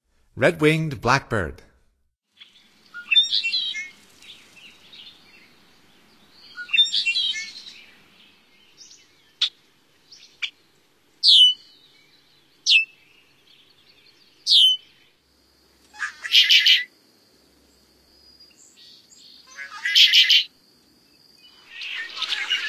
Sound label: Red-winged Blackbird, Songs of Birds of North America; Stokes